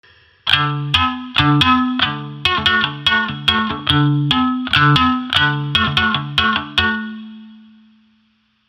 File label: guitar